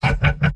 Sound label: laugh evil; pyro; tf2; garrysmod; mvm